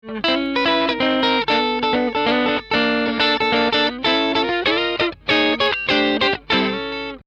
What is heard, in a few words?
Deluxe Jazz